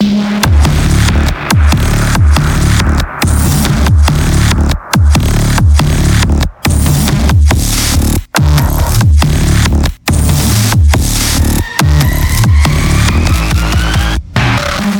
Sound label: heavy; Doom; VEGA Core